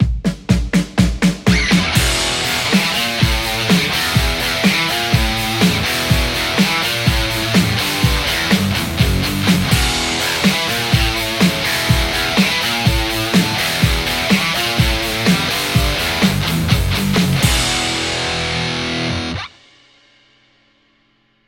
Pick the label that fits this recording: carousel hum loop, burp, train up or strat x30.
strat x30